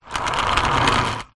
household vacuum cleaner suction nozzle on wheels roll on tiled floor